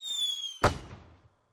firework; Microsoft Solitaire Collection

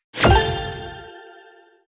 click btn

